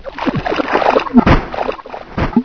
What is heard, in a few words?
barnacle die